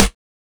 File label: drum hit normal